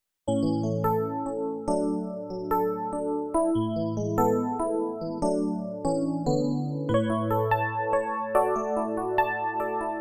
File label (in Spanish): Yamaha p45 Teclado Electrico